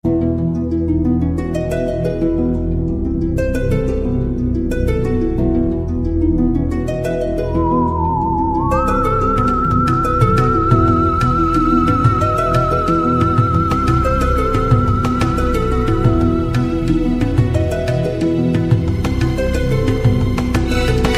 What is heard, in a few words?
music ringtone; turtle odyssey 2